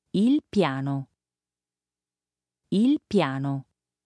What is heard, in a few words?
piano